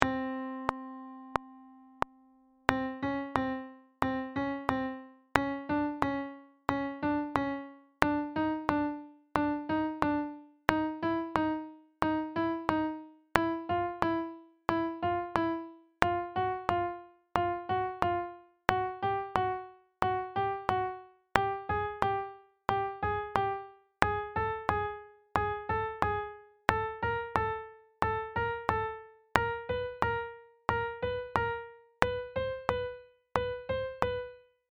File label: pitch training slow